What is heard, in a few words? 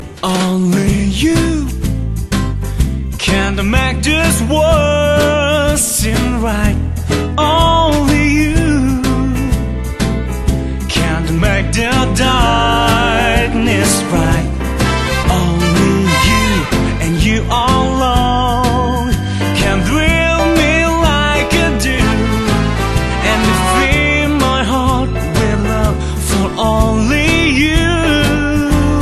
Korean